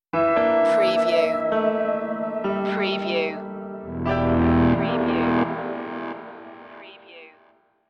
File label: detective stinger